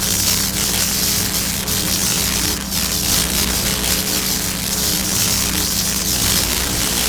Box Electric Loop, Fuse Box; Warframe

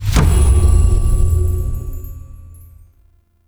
Notification; Duviri; Boon

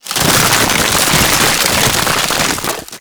Frost Ice Wave Spikes; Warframe

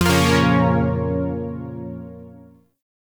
Shawzin Hirajoshi Chord; Warframe; Zariman Shawzin

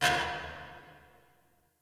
Parking Lot Metal Door Distant; Warframe; Ambience; Nineteen Ninety Nine

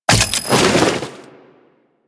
Turret Throw; Warframe; Corpus; Corpus Railjack; Admiral Agent

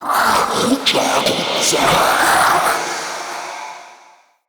Male Vocal Finisher Success, Enemy; Mockery; Mockeries

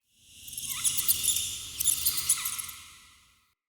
Witch Station Ruins Ghost Rat Chatter; Ambience; Soul Plane

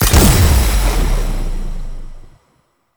UI Mod Fusion Achieved; Mods

